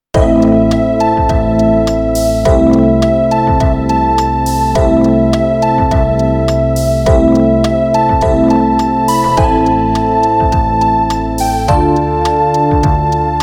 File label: New Melody Synth Band 104 bpm